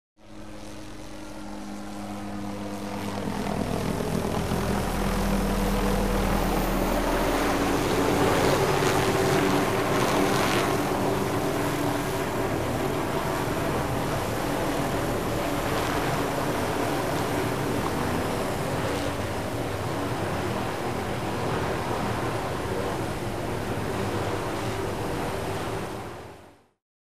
Helicopter flies slowly

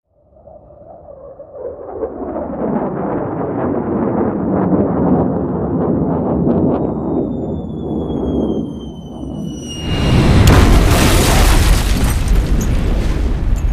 Bomber plane flies, drops a bomb, explosion; explosions

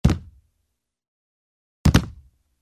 Jump on the wooden floor with shoes; steps

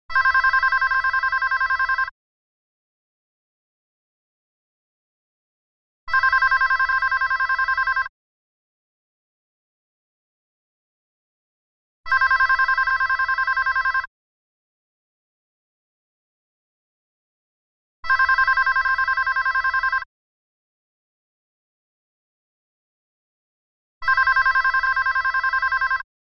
Phone ring

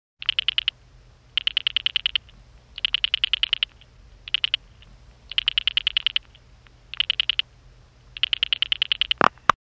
Pulse dial sounds in the handset; telephone

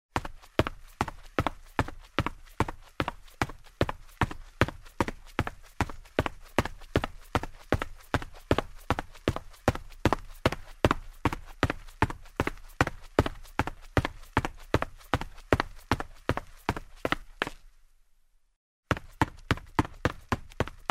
Running on a wooden floor in shoes; steps

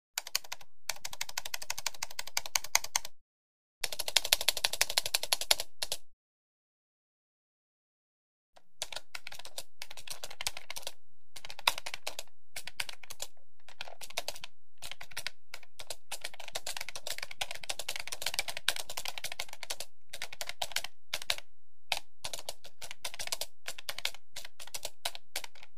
Computer keyboard

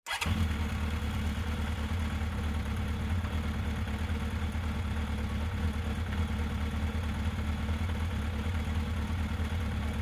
Motorcycle starts, regassing, jamming

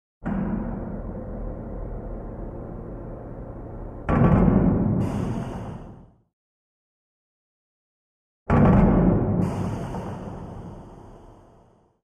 Hydraulic door

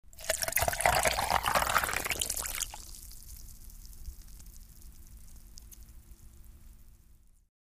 liquid in a glass with ice; water

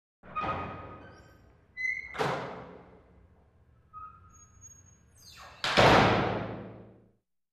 metal door closes

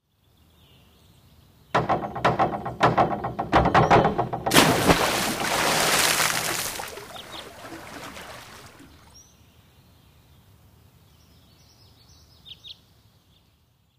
Springboard diving

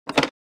Telephone receiver is picked up, hung up

